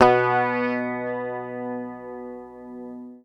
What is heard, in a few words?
PICK, Bass Library